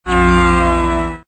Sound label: plane